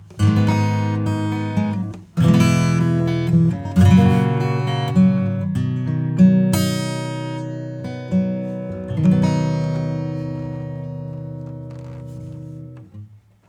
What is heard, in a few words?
acoustic Guitar Clarity; Oxygen